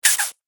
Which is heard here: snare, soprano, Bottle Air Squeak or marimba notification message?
Bottle Air Squeak